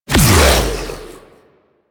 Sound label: Regurgitate Impact; DE; Warframe; Warframes; Grendel